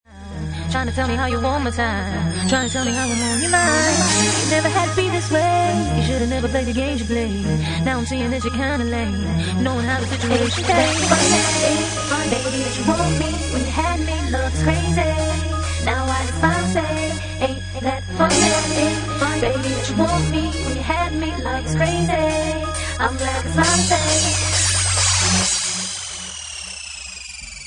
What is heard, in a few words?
Bassline Remix